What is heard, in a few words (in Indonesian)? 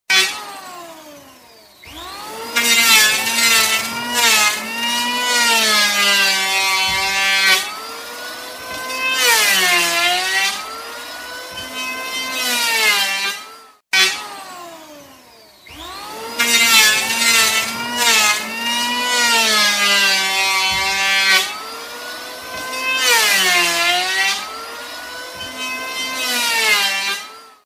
nada dering alarm suara ngetam kayu